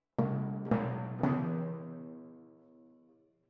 Twin F Timp

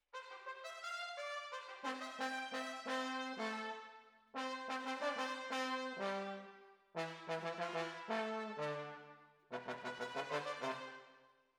Trombones, jazz for piano

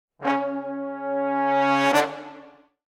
Brass, Jazz for piano

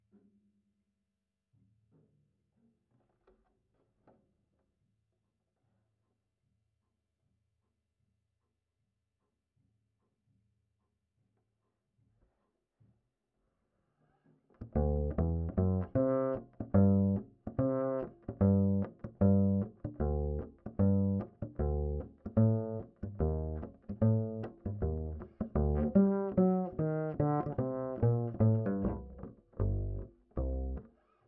Bass Line, Ragtime

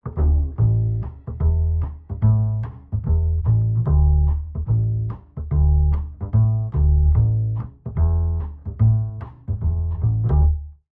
Bass, Jazz for piano

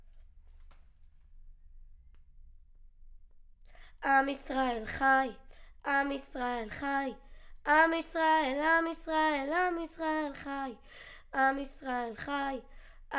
Children Choir; Studio Bekol Children Choir

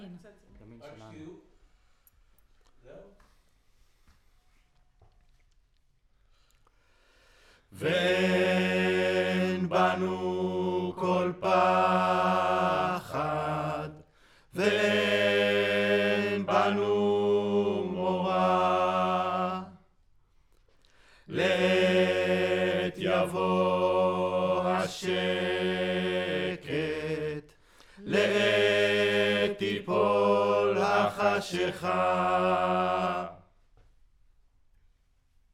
Choir

